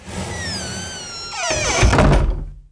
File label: door close; DAoC; Toa